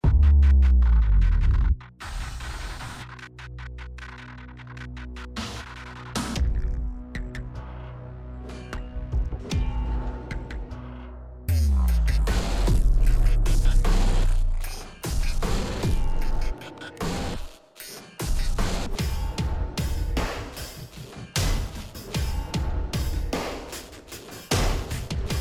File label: menu music; synapse